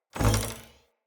Bow Shot Twang; Josalfar; Weapons

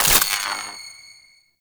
Void Tear Luminous Pickup

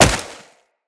Tenno Wall Jump Snow, footsteps, wall run; Characters

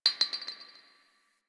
Garbage Glass Drop; Warframe; Nineteen Ninety Nine

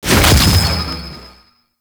Projectile Fire; Enemies; Abilities; Warframe; Sentient; Brachiolyst

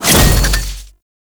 Void Dragon Grapple Connect; Enemies; Gameplay; Warframe; Duviri

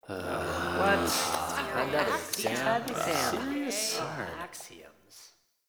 Student Groan; Cinematics; Warframe; TheNewWar; Zariman

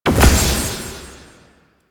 Corruption Pickup Large; Warframe; Missions; Zariman

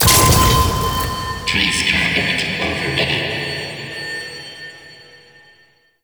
Capital Ship Conduit Override; The New War; Ambience; Mission Two

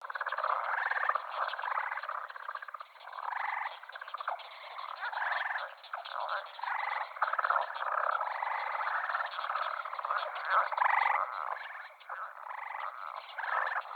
Frog Loop; Ambience; Midrath Island

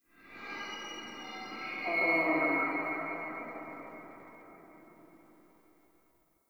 Stag Stampede Vocal Grunts; Powers

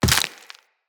Walk Bone, Footsteps; Operator

